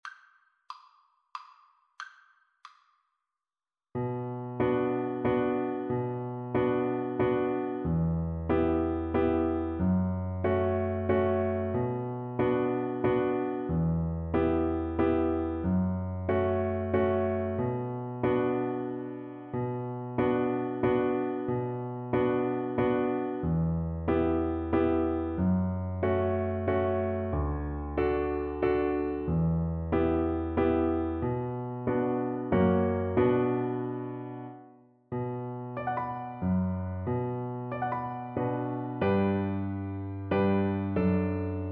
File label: Cello